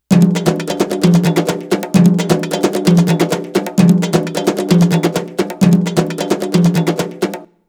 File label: Arabic Rhythms